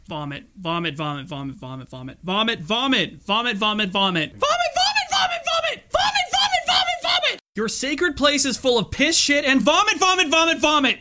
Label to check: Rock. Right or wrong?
Wrong